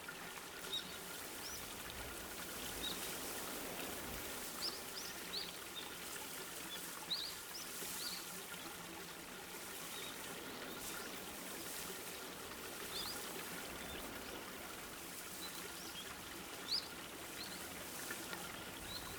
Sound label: outdoor onsen